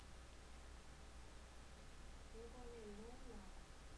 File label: glass